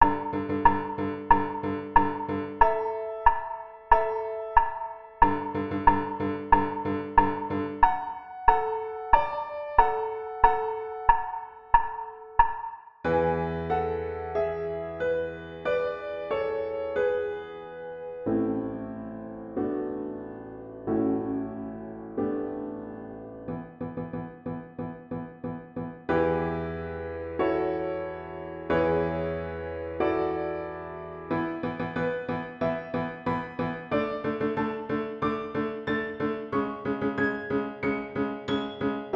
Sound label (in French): violon